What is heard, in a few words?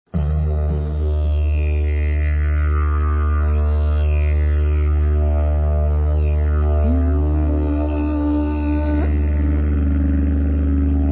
groove